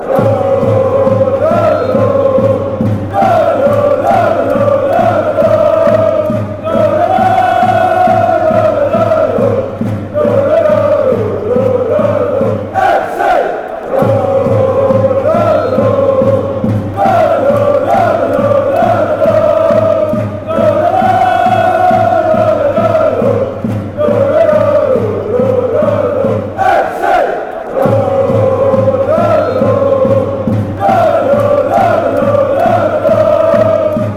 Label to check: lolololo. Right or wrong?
Right